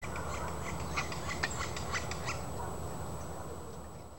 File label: green barred woodpecker